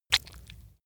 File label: leather impact mud medium